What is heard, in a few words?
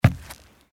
footsteps wood